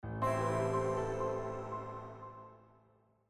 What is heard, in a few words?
jingle